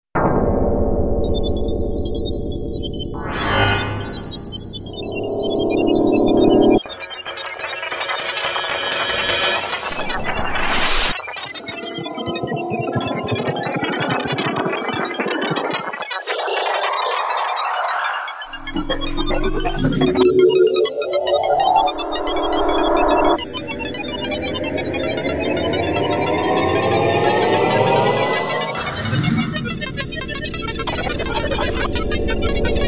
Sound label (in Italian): sigla stereo